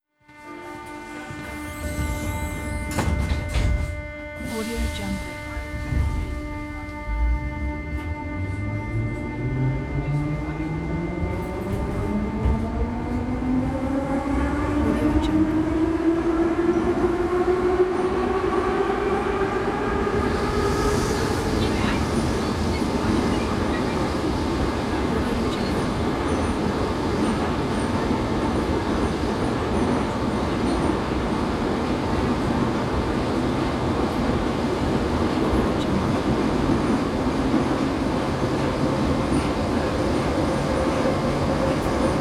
inside the car subway

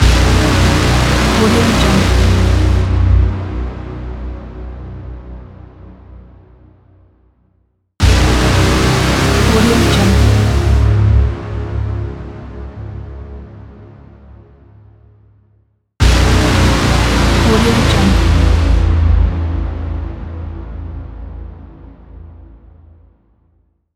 cinematic trailer mega horn; aurasonic

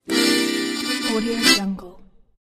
cartoon accordion closing

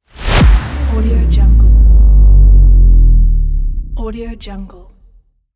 long bass drop

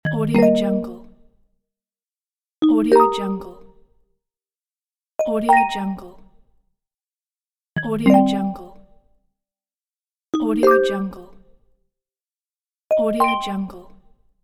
complete notification

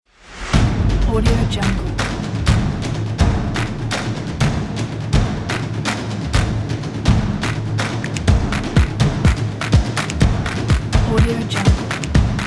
drums

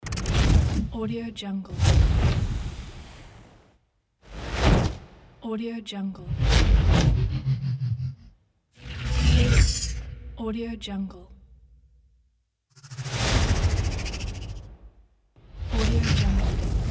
extreme whooshes

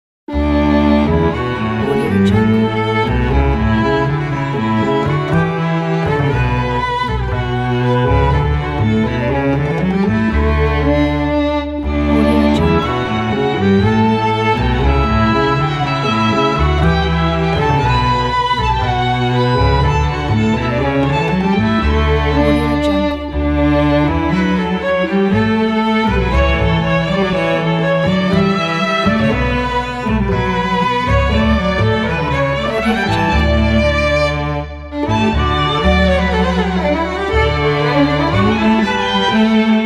baroque library hall

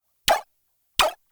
electronic beeps single blurty tone blats; prosoundfx

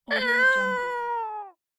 kitten

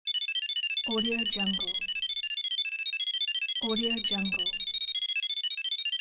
calculation; ponyrai

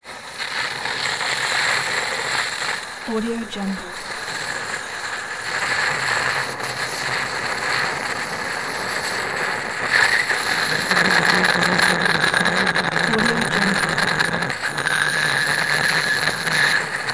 searing steam constant airy blast

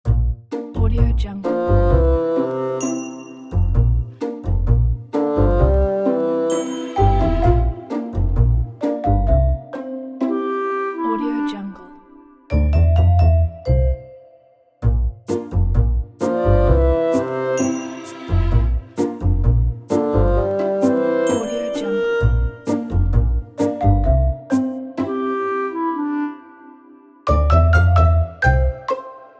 kids cartoon; deeflack92

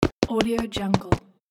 cartoon running footsteps

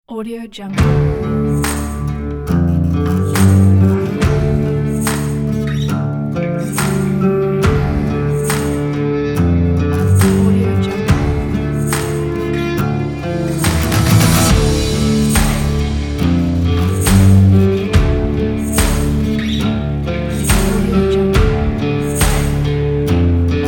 western rock

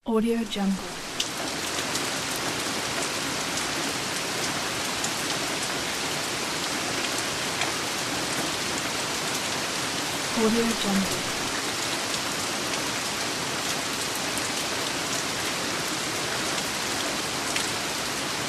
rain

